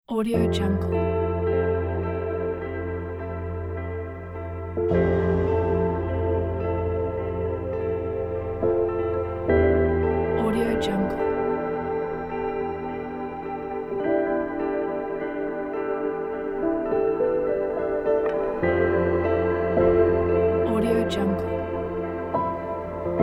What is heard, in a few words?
ambient and fractals